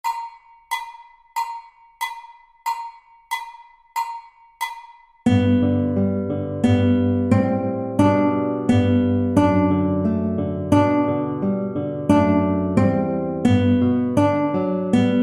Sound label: Meadow stroll 2 guitars; B string